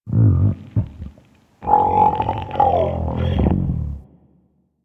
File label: Landscape Mushroom Creak, Ambience; Warframe; Venus Open World; One Offs